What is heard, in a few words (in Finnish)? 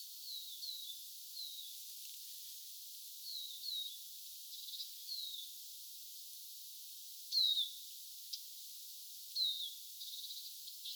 tiiiy vihervarpunen